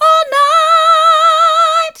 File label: all night mid, vocal